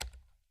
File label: type; NotDotTeam